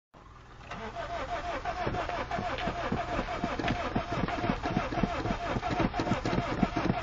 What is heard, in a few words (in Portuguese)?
carro afogado